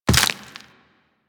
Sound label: Walk Bone, Footsteps; Warframe; Operator